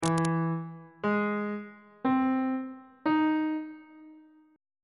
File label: d double sharp augmented major 7th chord intervals; bass clef